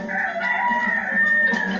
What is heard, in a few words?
corn flakes rooster; kelloggs; cornelius